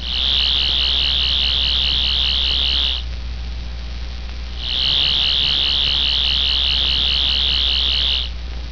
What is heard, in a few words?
sonic screwdriver